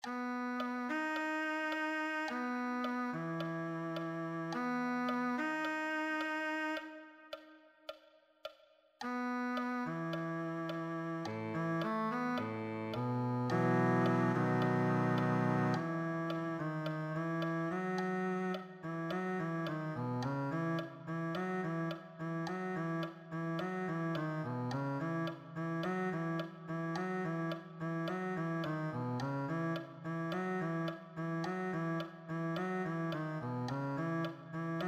cello

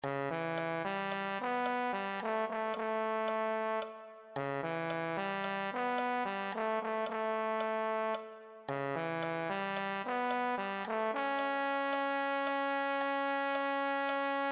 euphonium

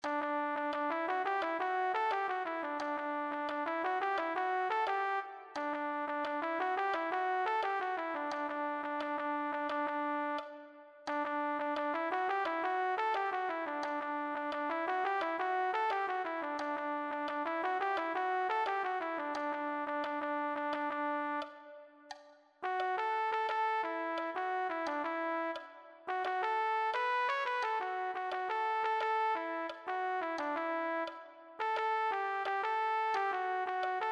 trumpet

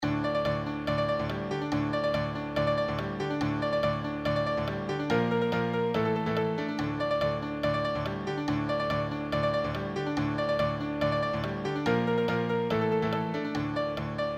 solo, piano

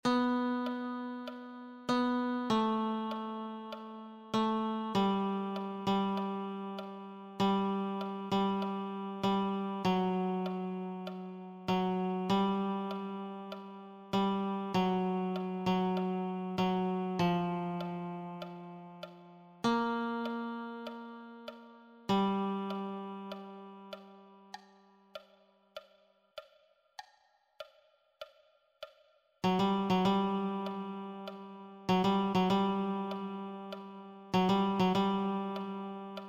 guitar

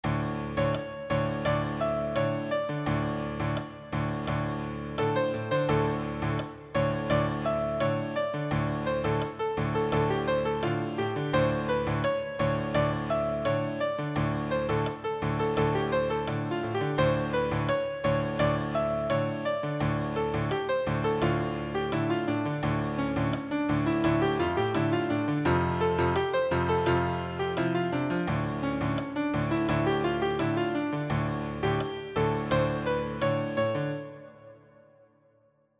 solo, piano

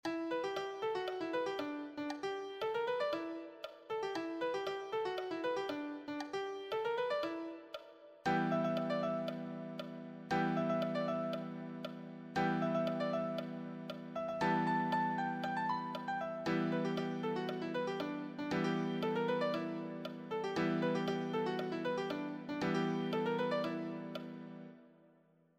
easy solo

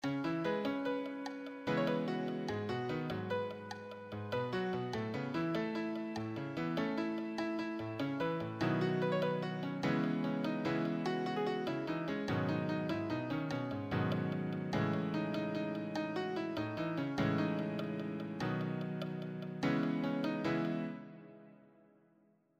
solo, piano

